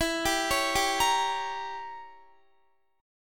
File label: E Diminished 7th E, Guitar; Edim7; arpeggiated